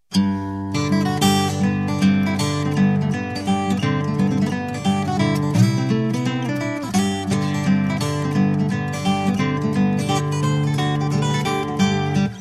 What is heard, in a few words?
acoustic